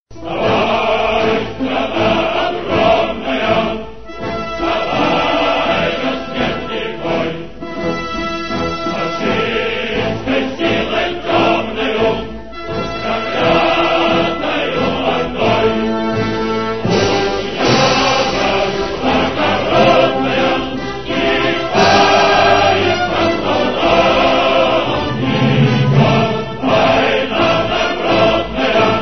russian war song

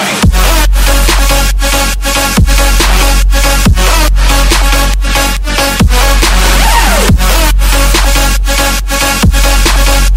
Trap Tune

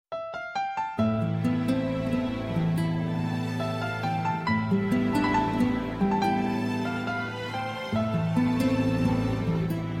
piano

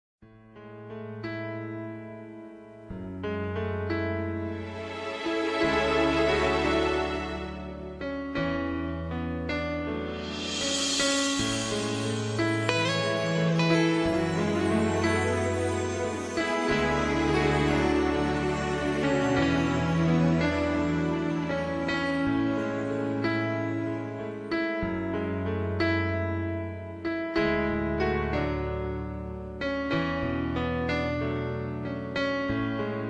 karaoke

